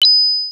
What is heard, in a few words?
Kiss; FIFjr